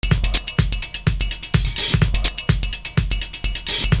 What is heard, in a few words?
BELL OF A HH, Kitch 70s, 126 LOOP; Univers Sons